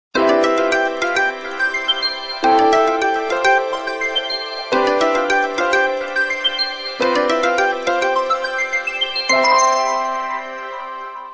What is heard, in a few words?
Sony Awesome Tone